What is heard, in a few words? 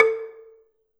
Gambang Hard Beater, Gamelan